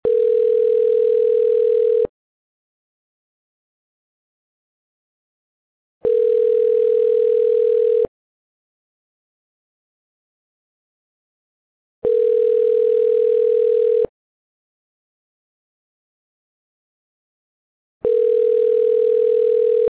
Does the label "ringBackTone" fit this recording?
Yes